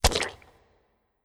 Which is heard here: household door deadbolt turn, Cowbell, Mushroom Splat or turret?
Mushroom Splat